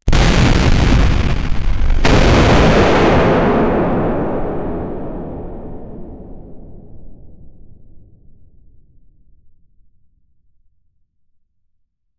realistic explosion sound

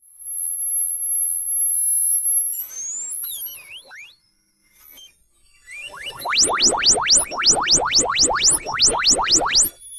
a robot powering up with

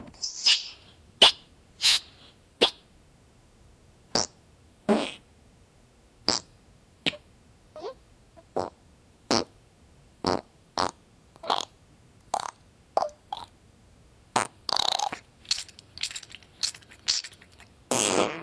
different types sounds of, slapstick

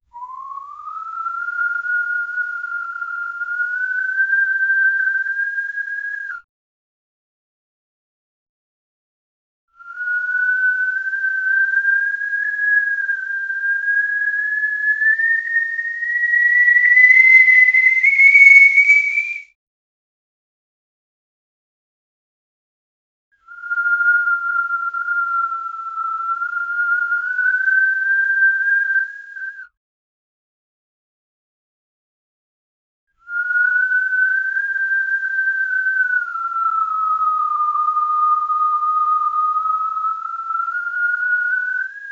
whistling piched dramtico; expressive

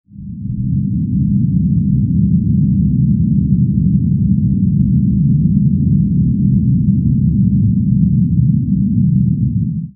drone

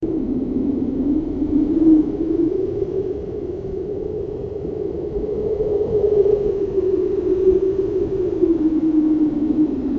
constant cold night wind

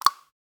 quick spritzof spray can; thwack

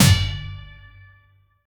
forging success sound qui, success

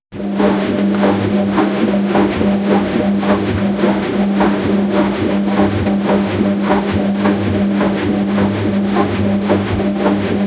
sound of machine washing, cleaning

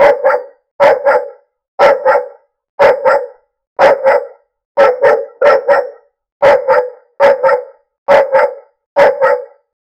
artificial dog barking; robotic

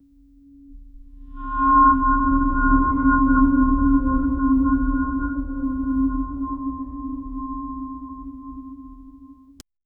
a slow resonant metallic; divine

